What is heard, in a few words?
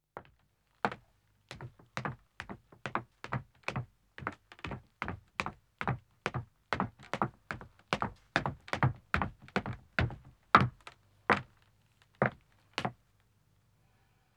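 Wooden Stairs Descending, 1 Man, Footsteps; BBC Sound Effects Library; Footsteps II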